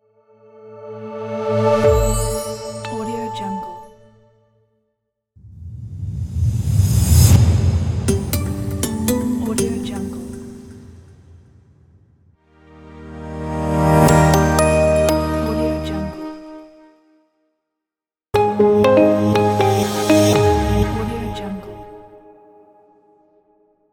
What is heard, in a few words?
simple logo pack